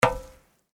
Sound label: Footsteps Iron plate One